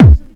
deep kick single hit d key, kickdrum sound tuned to the D note